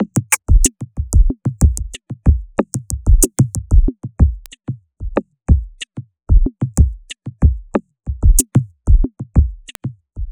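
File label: Percussion, dance; Sonic Foundry (Sony Creative Software) - Future Retro Dance Excursions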